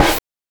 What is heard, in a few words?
OH; NES DPCM; MIDINES